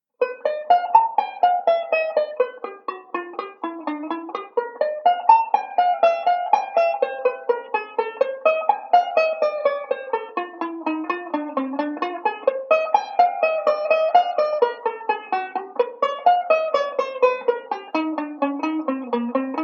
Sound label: slow, chord melody; Beyond Chord Melody Volume II